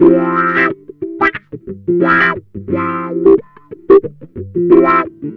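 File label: wah funk; Jazzistic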